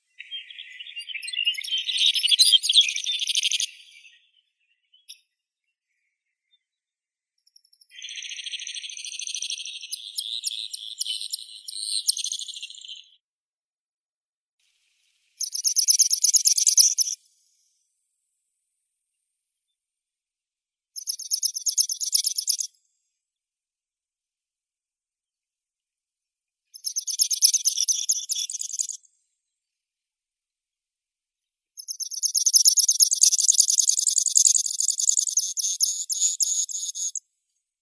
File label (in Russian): konek sibirskii anthus gustavi